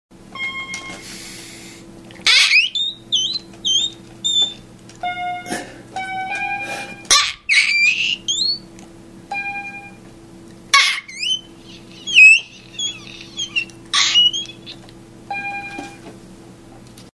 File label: highest note